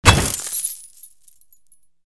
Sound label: Lock Break; Environment; The Binding of Isaac